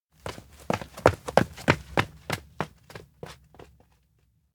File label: steps indoor run away; meguriai; spica; anemoi パッケージ版 1.1